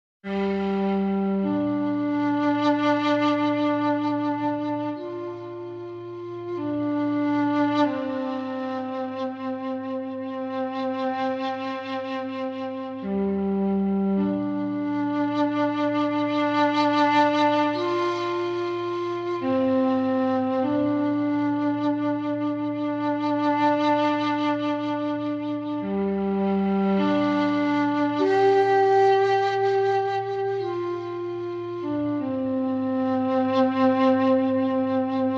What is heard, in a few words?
Flute Only